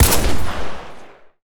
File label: Deagle